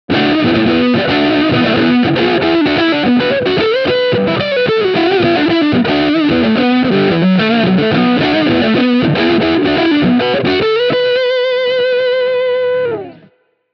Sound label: Middle Pickup Hot Blues, guitar